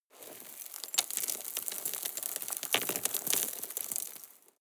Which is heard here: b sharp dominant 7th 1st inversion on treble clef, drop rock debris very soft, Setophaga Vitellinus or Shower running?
drop rock debris very soft